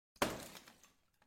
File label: Crack Sound